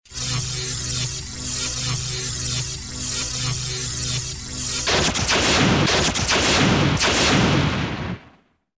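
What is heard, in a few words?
zvuki toka, electric current sounds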